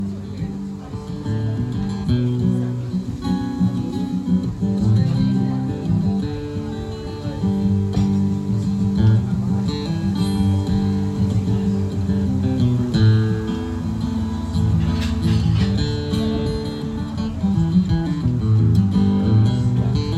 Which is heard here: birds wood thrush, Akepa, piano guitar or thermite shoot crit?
piano guitar